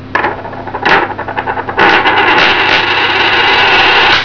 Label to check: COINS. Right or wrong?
Right